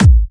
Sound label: BD Punchy; Vengeance Samples; Bassdrums